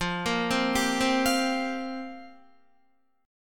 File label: F Suspended 2nd Suspended 4th F down Guitar; Fsus2sus4 arpeggiated